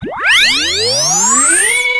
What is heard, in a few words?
x charging; MMX4SFX